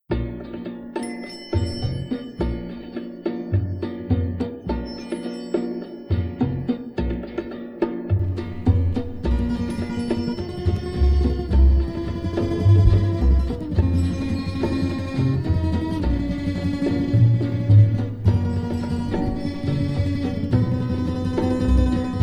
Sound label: strings with brass